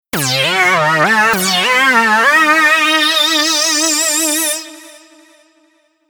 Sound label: worry